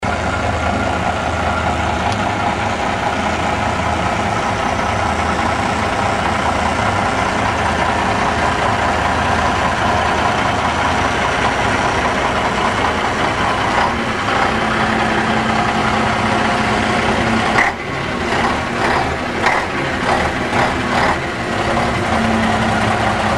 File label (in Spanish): sonido pegaso troner 370cv